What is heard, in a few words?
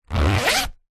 plastic document wallet zipper; zapsplat